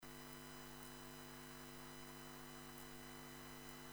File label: noise; ピアノ